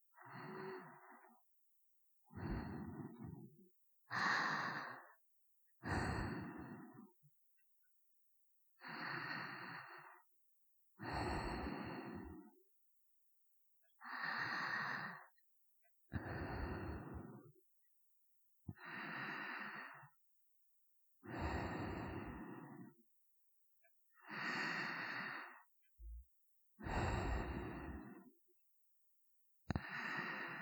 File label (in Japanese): 寝息ループ